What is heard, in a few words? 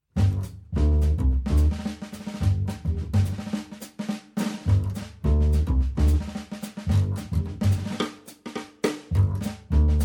Triads